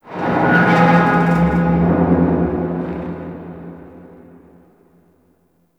Metal Wronk; Warframe; Tau; Ambience

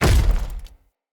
Step Default, Footsteps; Enemy; Nimrod

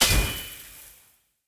Sword Throw Loop Recall End; Weapons; Player; Swords; Fenn Jotar Sword

